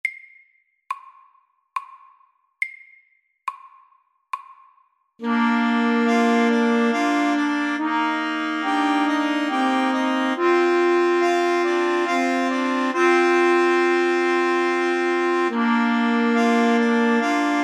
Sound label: Musette SLOW